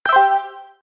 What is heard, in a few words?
message alert, notifications; Sony Ericsson Xperia X10